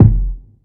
phat kick single shot f key; kick drum samples

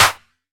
reverb clap one shot b key

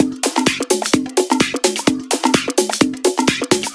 spanish drum fills and rides tech; drum loops

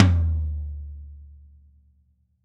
hi tom drum one shot d key; Duration 0:02; Bitdepth 24

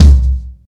verby kick single shot c key

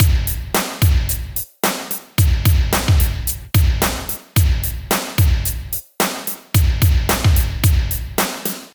110 bpm breakbeat b key

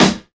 smooth acoustic snare sample G sharp key; snare drum samples

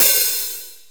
perfect open high hat g sharp key; open hat samples

